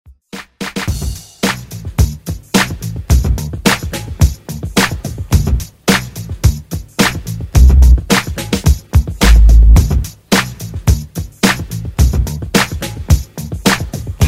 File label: 70s